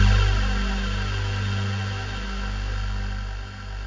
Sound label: HUSH C3; ensoniq; sq80